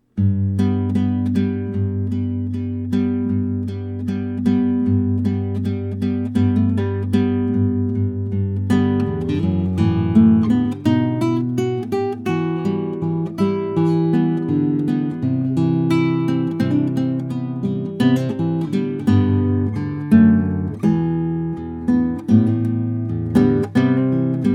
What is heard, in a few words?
guitar